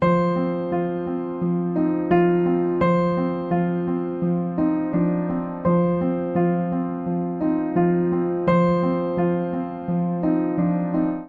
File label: Ostinato F Dorian 85 bpm, Upright Piano; Focus; Upright Piano by Spitfire Audio; Ableton; Factory Packs